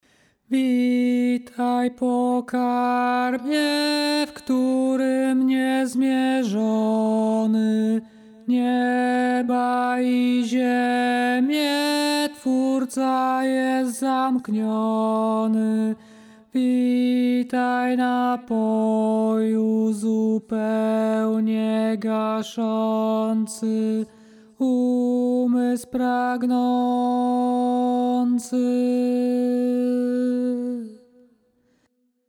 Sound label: tenor; Witaj Pokarmie